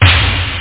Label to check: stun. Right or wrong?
Wrong